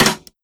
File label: Snare, drums; Ableton Live 12 Lite Core Library